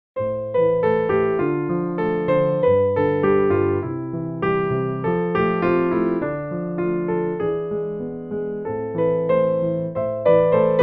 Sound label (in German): klavier stimme training